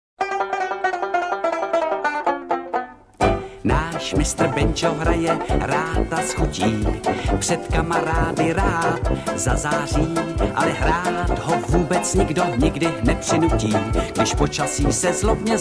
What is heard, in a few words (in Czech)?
Mistr Banjo